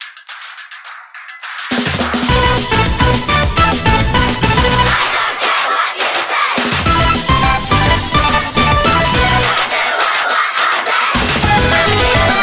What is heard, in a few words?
guitar